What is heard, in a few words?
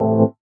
stabs hits